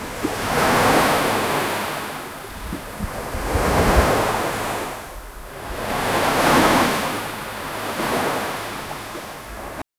sea waves washing against, wooden ship

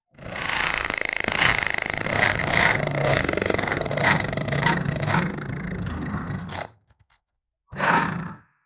mechanical cybernetic hor, cybernetic

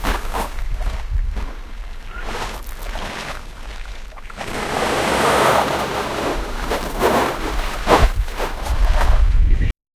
jeep travel on beach sand

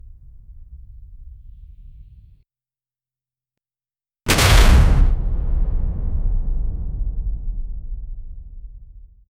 deep whoosh and impact

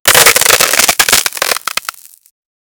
ice

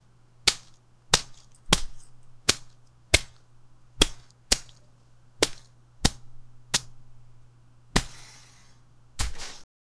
rapid wet flesh on flesh, gore

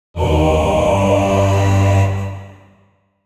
deep gothic mens choir, level up

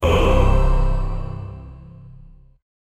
deep gothic mens choir; hard hit

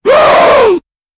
human battle cry sounds, angry

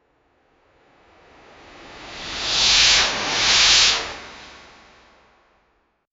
a short clean cinematic s, motion

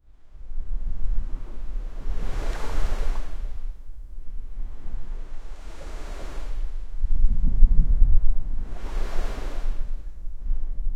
a man waking up sound; sound effect

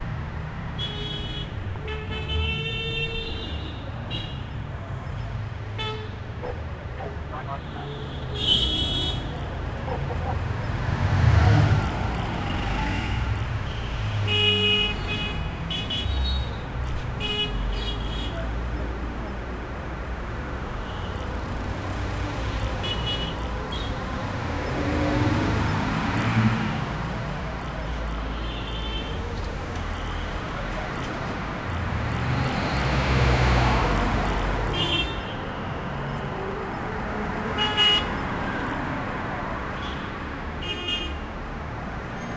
the hum of cars passing, bustling

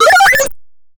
gba sound effect retro sfx; pokemon

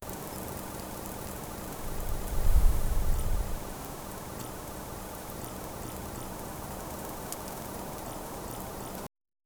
wind grass meadow soft wind

